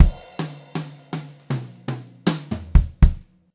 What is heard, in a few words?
FILL, Brushed Drum Loops; USB Soundscan vol.39; AKAI